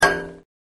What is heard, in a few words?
metal plate